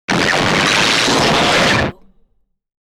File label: granulated electronic scanner